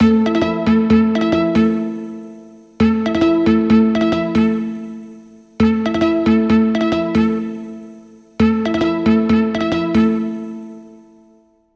Persia Bass

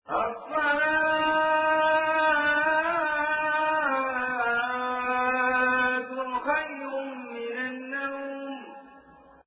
azan; Spreadtrum source code audio

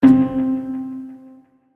Notification

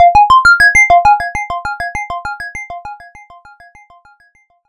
Graceful, notifications

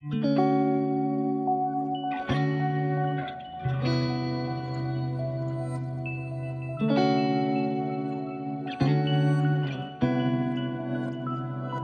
Go Beyond Guitar, alarms; Honor Magic 8 Pro

